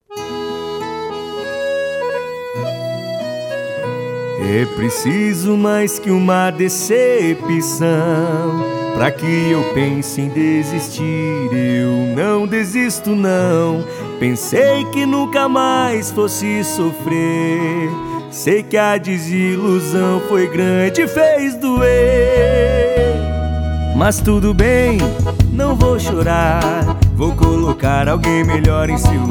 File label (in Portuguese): Jingle VEREADOR; Quero ver minha cidade renascer; RENOVACAO